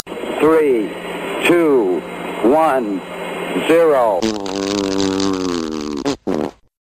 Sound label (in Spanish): Lanzamiento de cohete; graciosos